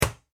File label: Plastic Ball Bounce; toy plastic ball single bounce; Cartoons; Bounces; McKinney Sound